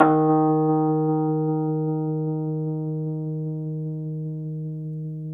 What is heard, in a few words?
Rhodes 73 D#; E-MU Producer Series Vol. 5 – 3-D Audio Collection; 3DRhodes